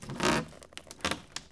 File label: wood lite creak; blueorange